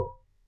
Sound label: ingredient wrong